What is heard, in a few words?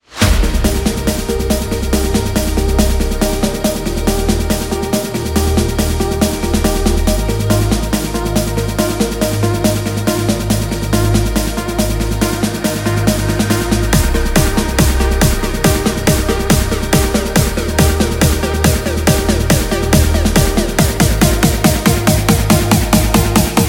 Melodic Bass Sample Pack; DVRKCLOUD